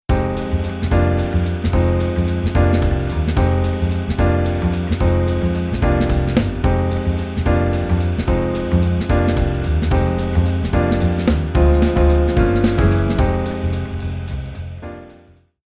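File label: Jazz Waltz Rhythm snare drum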